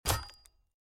sword Wood

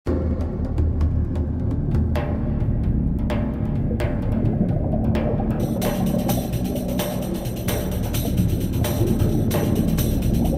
Underwater; Puffle Rescue; club penguin

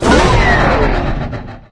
Vehicles; SFX Vehicles Manta

